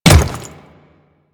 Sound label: Default Step, Footsteps; Royal Guard